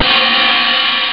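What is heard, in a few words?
open hi hat; jazz jackrabbit 1; M8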